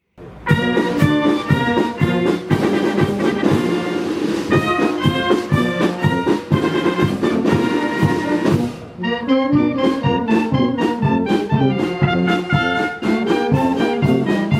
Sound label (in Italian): Banda; Le campane di San Giusto; Banda Corpo Militare CRI; dir.M.Rosi